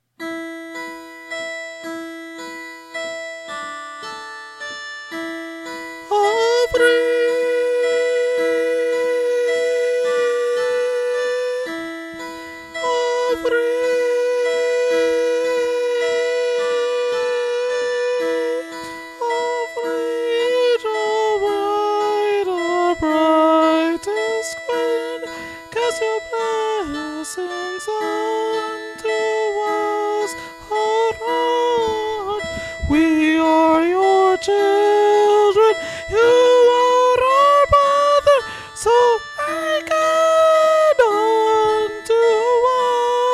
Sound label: hymn soprano; music recordings